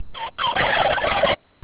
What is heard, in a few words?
gobble